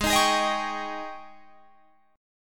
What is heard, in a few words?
Ab Major 7th Suspended 4th Ab Guitar Drop D; down